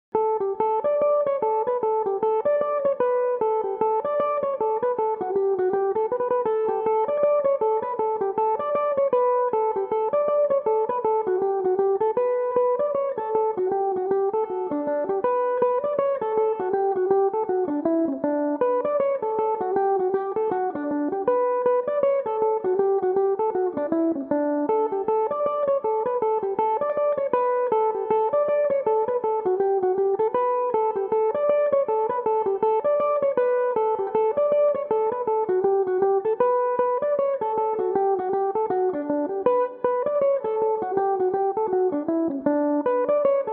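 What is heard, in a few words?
d major, mandolin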